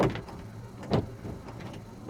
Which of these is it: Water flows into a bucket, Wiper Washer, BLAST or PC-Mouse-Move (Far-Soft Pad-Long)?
Wiper Washer